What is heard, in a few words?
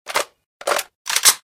98k reload; fps3d